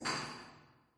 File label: warehouse brick drop; ambient, rocks